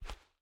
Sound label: Barefoot; MiSide